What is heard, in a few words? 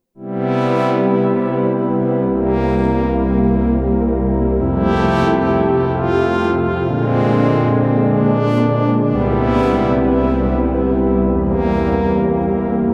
BRASS